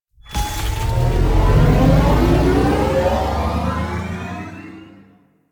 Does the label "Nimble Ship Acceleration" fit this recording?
Yes